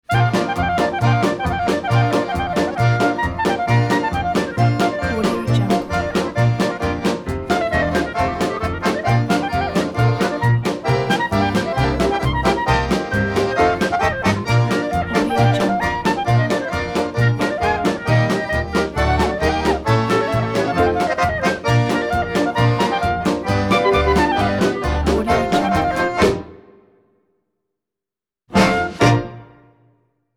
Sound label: carousel polka logo